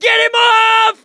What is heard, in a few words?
scream while pounced, mechanic, survivor